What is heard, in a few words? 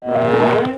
player Grow; Feeding Frenzy